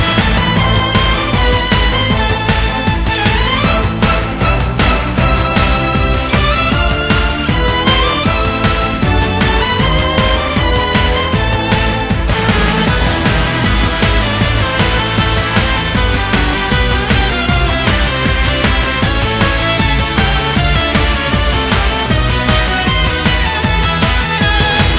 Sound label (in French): doux orchestre